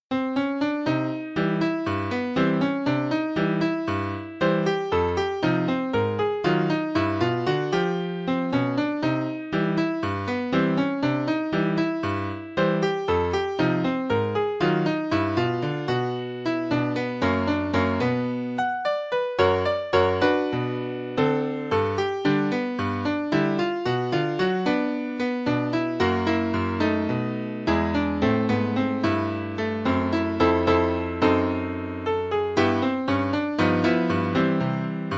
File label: ragtime